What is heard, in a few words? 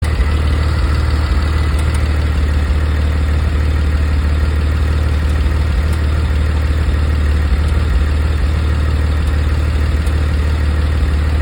engine ticking noise ticks, gt4; 718 gts 4 0 gt4 gt4rs spyder 25th anniversary